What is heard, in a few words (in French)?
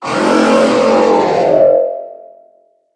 Cri Méga